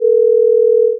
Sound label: transfer hold ring 16000